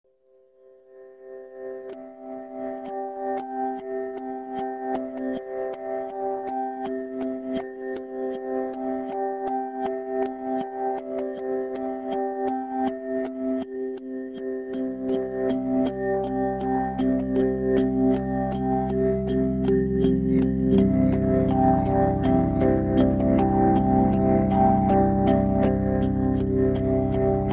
LULLABY

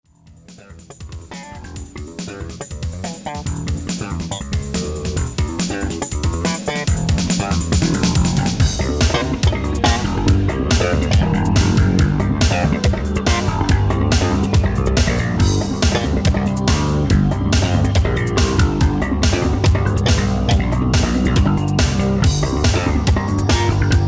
funk

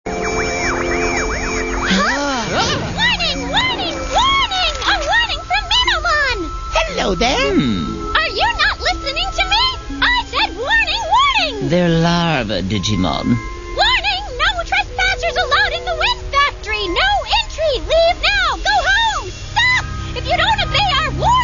Warning

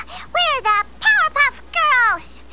bubbles intro

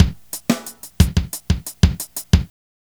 Drum

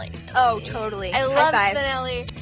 hi five

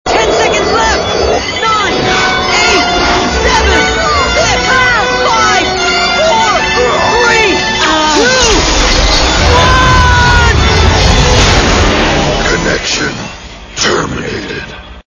warp

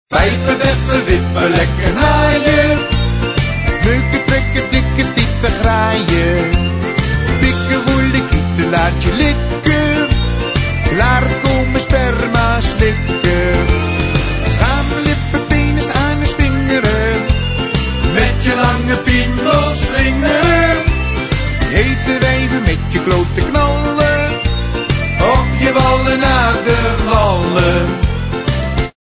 Ringtone

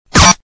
watch detonator

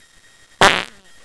fart

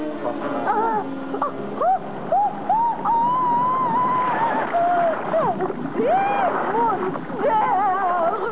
scream

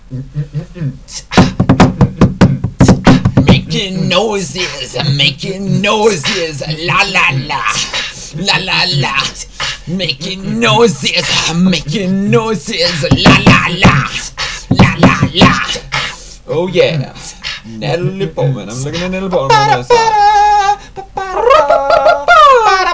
making noises

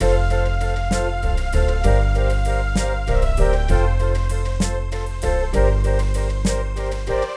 Love Strings

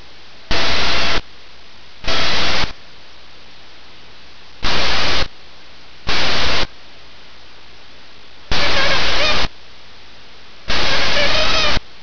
whine, turkey

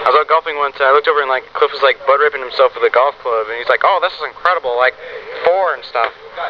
golfing

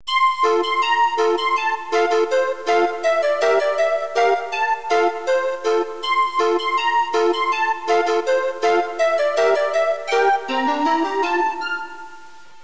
Circus

